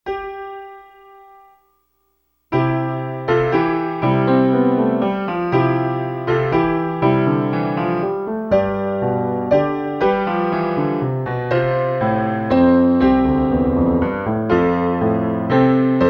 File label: Silent Night (Piano Arrangement); christmas